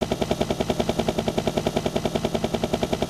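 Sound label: helicopter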